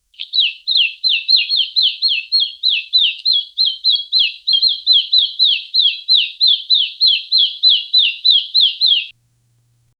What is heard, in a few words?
the clear high pitched ch, bird